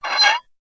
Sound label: Squeak Tiny Screw, screws